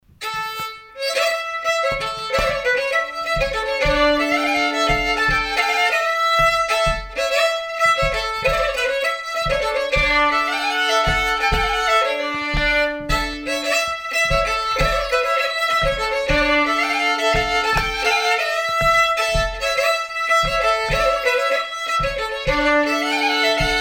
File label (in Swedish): Polska; e Haga Olof